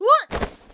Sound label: voice tackle; FantasyTennis